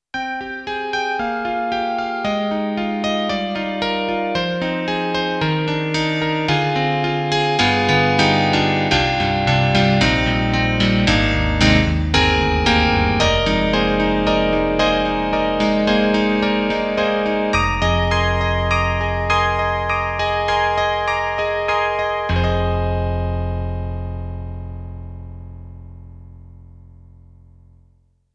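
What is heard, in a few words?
EDIROL SD-80, Electric Grand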